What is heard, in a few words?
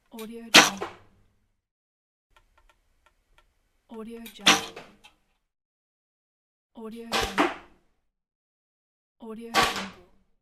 crossbow shot